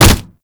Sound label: Generic Hit